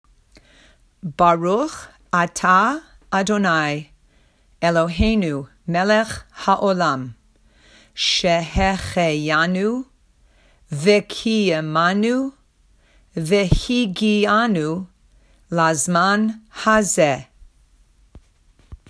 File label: Shehecheyanu